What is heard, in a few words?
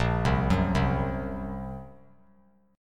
Bb Suspended 2nd Sharp 5th; Bbsus2#5; arpeggiated; JGuitar